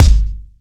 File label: short reverb bass drum single shot G key